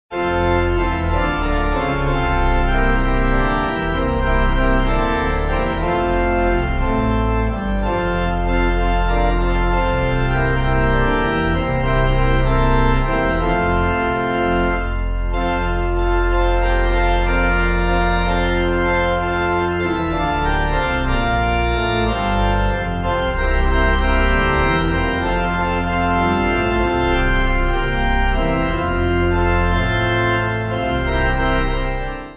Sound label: Pipe